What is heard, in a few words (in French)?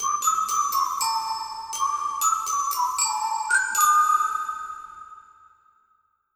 Signature sonore; Peps